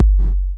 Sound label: KIT, Dance Mega Drums; Best Service